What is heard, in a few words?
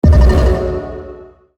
armsrace become leader team; Counter-Strike Global Offensive